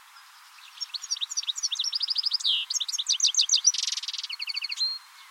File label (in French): chardonneret elegant; Trille de type Itération rapide Glissando Gazouillis vaRié; chant